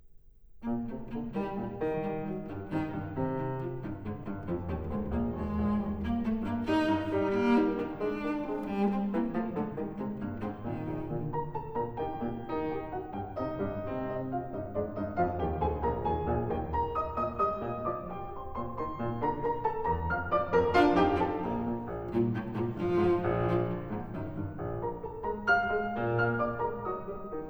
Variations for cello & piano in E flat major

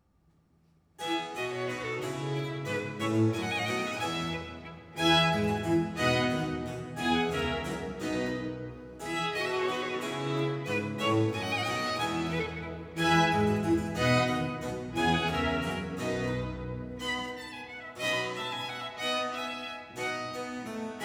Concerto Grosso in F major; Corelli

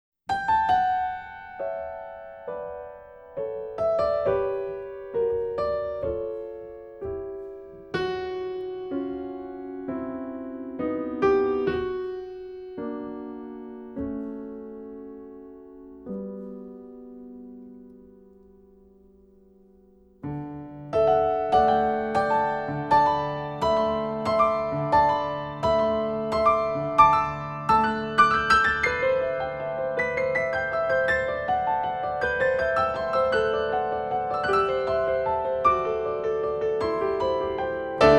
Solo Piano

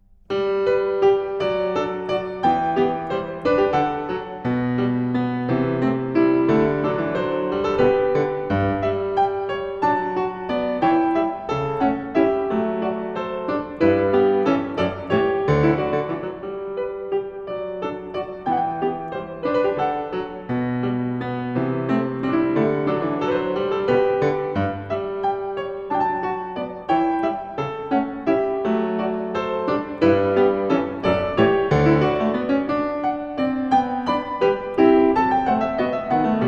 Variation; Bach; Goldberg Variations, BWV 988

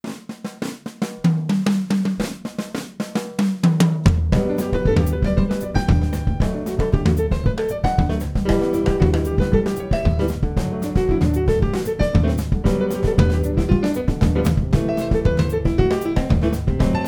Variations, for keyboard

